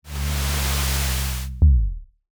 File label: horror door reveal